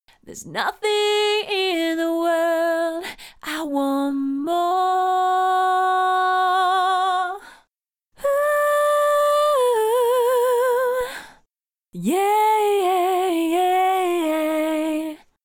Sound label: vox VariAudio; cubase 5